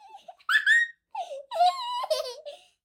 laugh, birthday

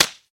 slap

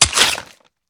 swipe

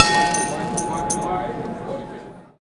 start auction

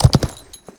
gallop, horses; CosmicRage

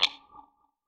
tap

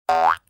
joins; CosmicRage; CosmicRageSounds

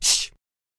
shush

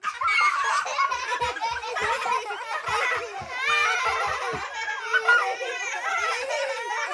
laughing; CosmicRage

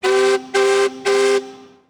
alarm

